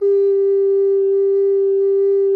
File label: GEMSHORN ALT G#1; SoundBytes - Early Patches